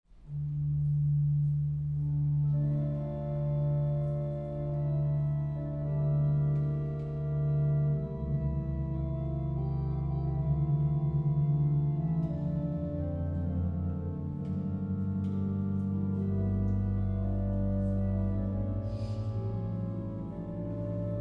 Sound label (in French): impro duo sur les tierces